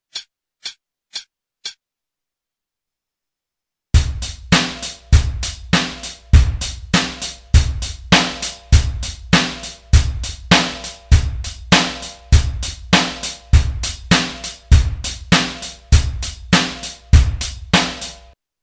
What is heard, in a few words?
chromatic scale backing, 100, guitar